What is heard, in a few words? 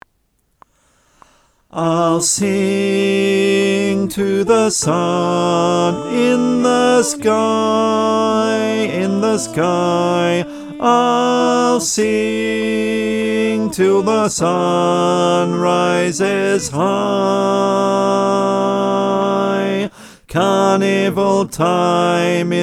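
tenor, choir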